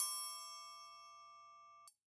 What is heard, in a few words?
Triangle E Open Mute; Acoustic Ringtones